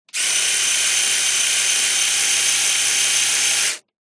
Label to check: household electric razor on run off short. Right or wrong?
Right